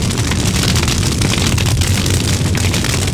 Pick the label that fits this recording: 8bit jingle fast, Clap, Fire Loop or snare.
Fire Loop